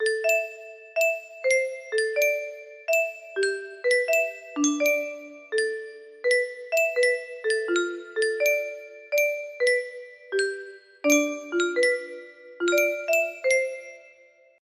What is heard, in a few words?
cheerful tune